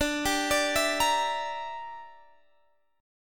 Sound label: A sharp 6th Flat 5th